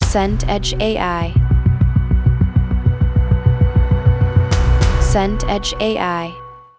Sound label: drone cinematic